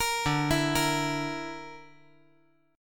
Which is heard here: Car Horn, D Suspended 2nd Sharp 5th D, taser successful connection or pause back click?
D Suspended 2nd Sharp 5th D